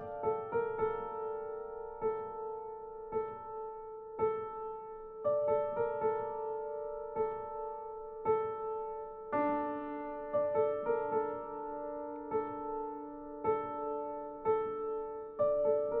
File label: piano theme loop